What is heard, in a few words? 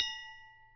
glass